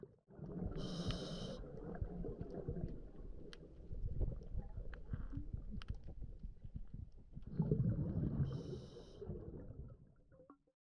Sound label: bubble sound